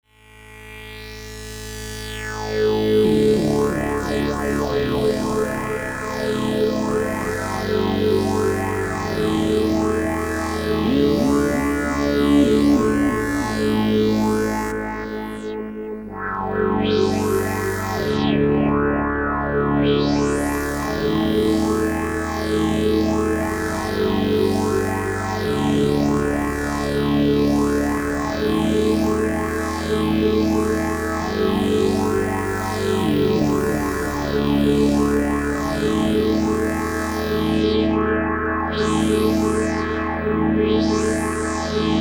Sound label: phaser